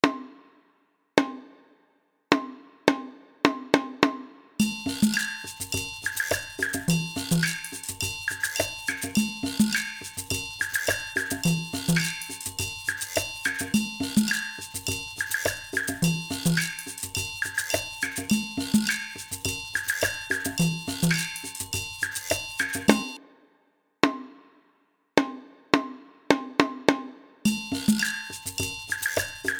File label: Percussion Loop 105bpm